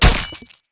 metal flesh; Fate Undiscovered Realms

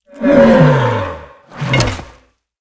die, demon minotaur; Fate The Cursed King; MONSTERS

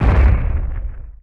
mid step; Fate The Cursed King

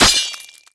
Slice Flesh; Fate

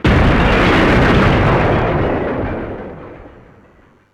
break; Monster girl quest Part1,2,3; Visual Novels

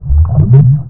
jelly step; Fate; MONSTERS; GEL

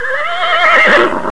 whinny, horse; Fate The Cursed King; MONSTERS

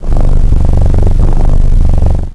purr, cat; Fate Undiscovered Realms; MONSTERS

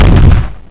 damage; Monster girl quest Part1,2,3; Visual Novels

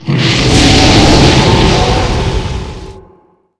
breathe, DRAGON; Fate; MONSTERS

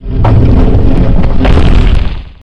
death, rock, monster; Fate The Cursed King

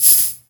chain drop; Fate The Traitor Soul; ITEMS

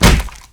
wood Flesh; Fate The Cursed King

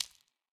footsteps hive; Fate The Cursed King

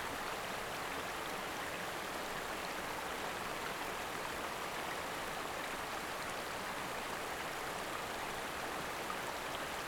waterfall; Fate The Cursed King

